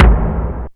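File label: DRUMTRAKS low tom, Drums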